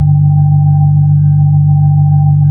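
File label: B-3 Brite, organ; Roland LCDP10 Keys of the 60s and 70s 2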